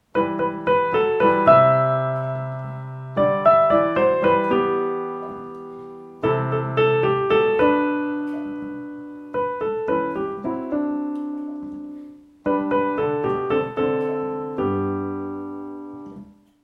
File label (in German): rhythmus